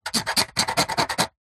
metal scrape sharp

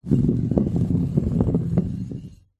bottle large glass empty roll wooden board; foley

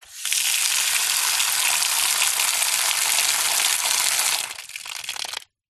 faucet outside tap turn on run off; household; zapsplat

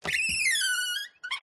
Wood Stress Creak Squeaks; DPA4060; pmsfx

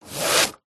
rope pull rub against surface; zapsplat

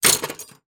industrial tool metal throw into tool box; zapsplat

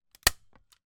office staple gun stapler stapling into wall

